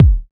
warm sounding kick drum sound F sharp key

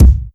prominent kick c key

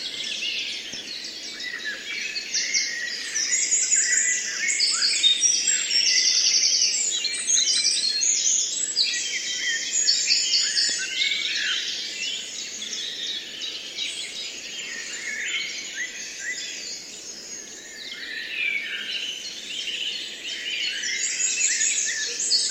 wren blackbird robin song; soundscapes

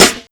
studio steel snare drum f sharp key

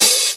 dampened open high hat sound d sharp key

